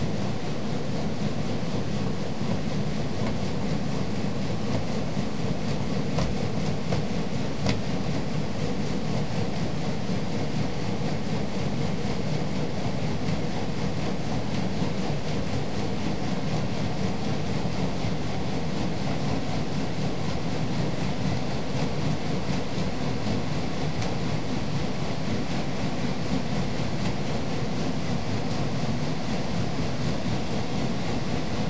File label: Noise